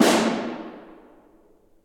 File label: Snare